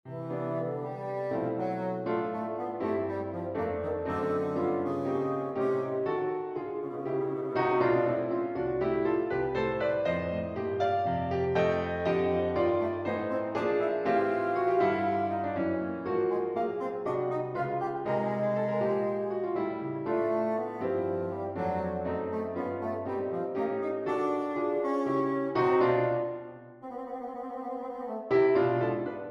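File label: Allegro, solo piano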